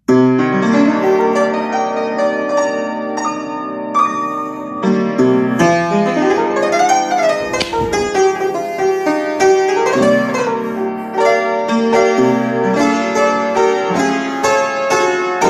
piano

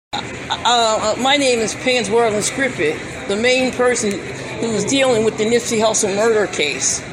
lead channel

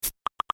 knock brush

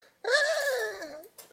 ehhhhh

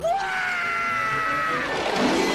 waaaaaaaaaa

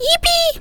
big yippee

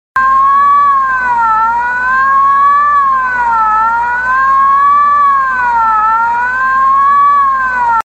vintage police siren

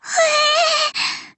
fuee

